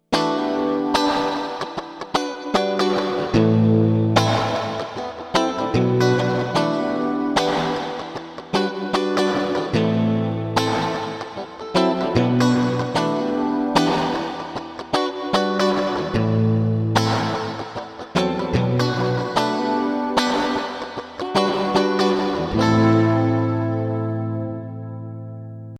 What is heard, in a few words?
Reverb; Rainger FX; Reverb X 2